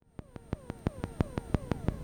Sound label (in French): la gare avec train, electromagnetic; electromagnetic Aix; KUBISCH